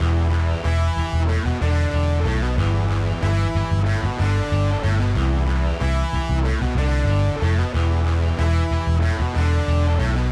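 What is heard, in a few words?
Bass Guitar Combo; Multitrack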